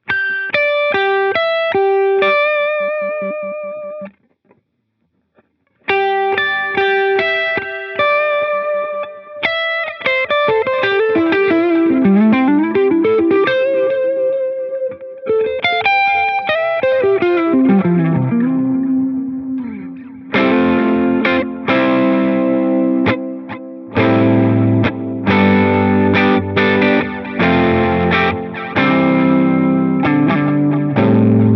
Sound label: Tape New Tape, echosystem